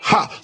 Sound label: "ha", Voice